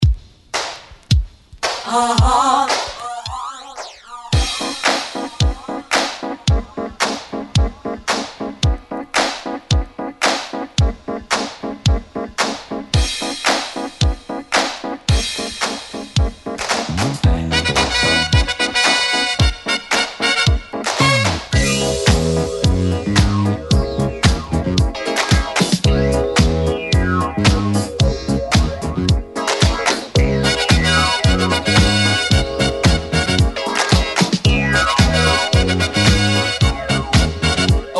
70s Extended 111Bpm; DJDOLLS

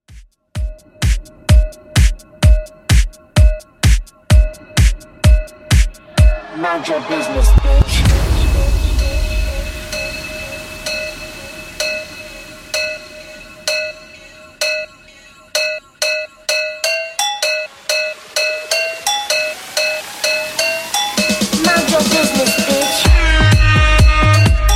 Bass House 128bpm; Redrum

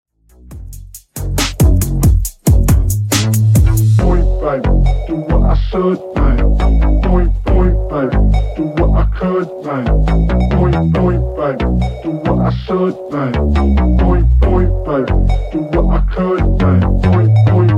Top40 138bpm; Xtend Edit

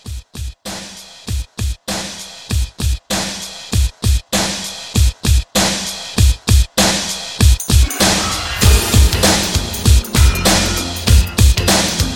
80s RNB Soul Re-Drum 98

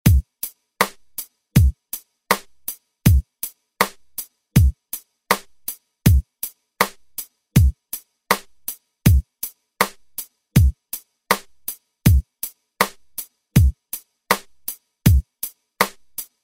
80s Re Drum

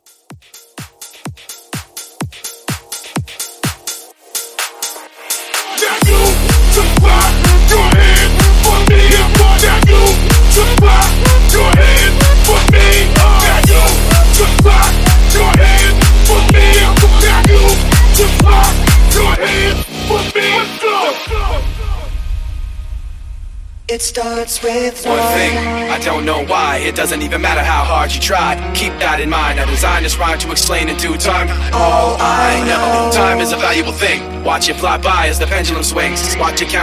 Hype

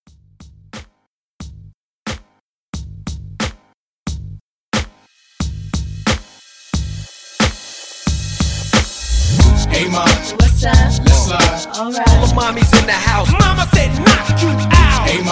Throwback Hiphop Hype Re-Drum 90

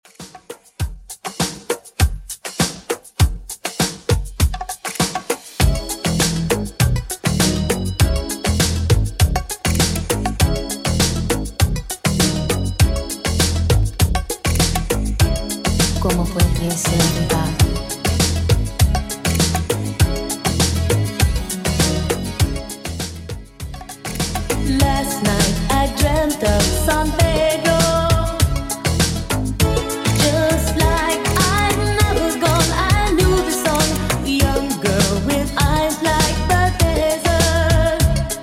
80s Latin Pop 100bpm; Redrum

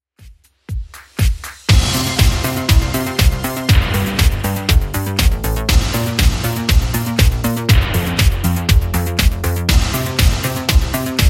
80s Redrum 120bpm

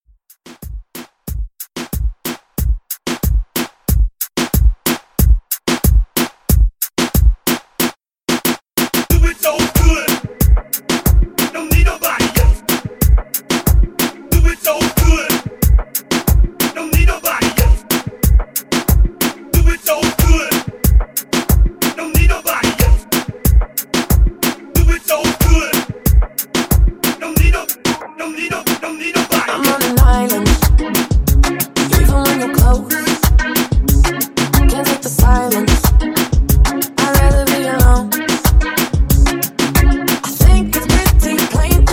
Pop Moombahton Redrum Hype; Remixfreakz

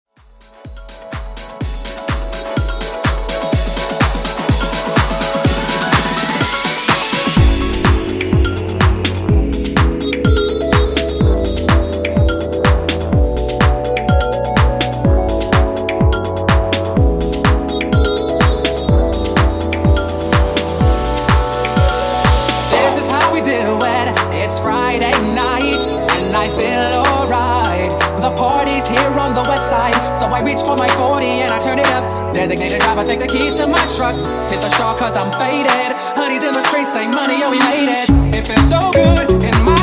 Extended House 125 Bpm

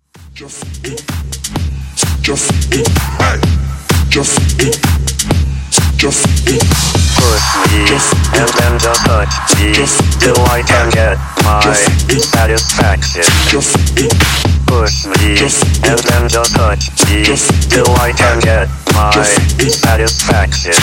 128 Bpm

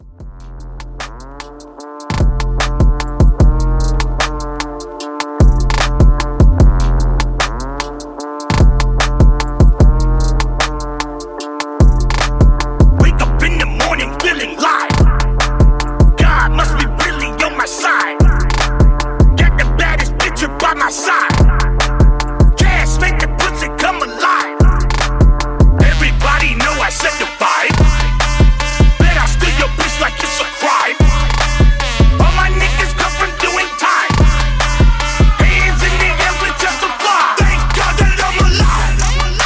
Hip Redrum 150 Bpm

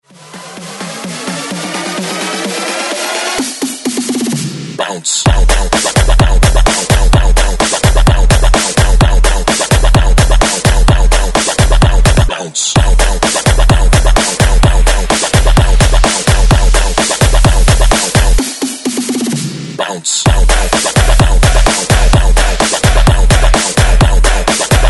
128 BREAK MIX; DJDOLLS; BREAKMIXSMASHER

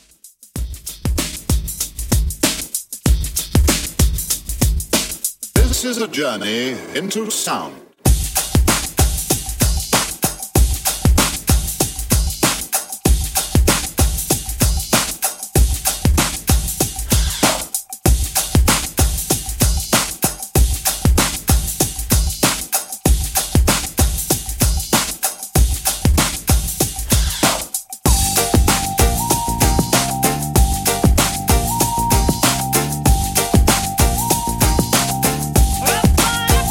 90s Extended Redrum 96Bpm

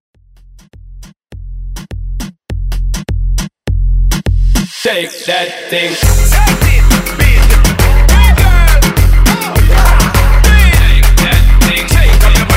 Moombah Hype Edit

